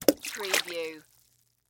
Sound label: Rock Skip Splash; sound effects bible